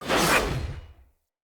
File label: Knight Axe Whoosh Heavy; Weapons; Enemy; Axes; Snc Knight Axe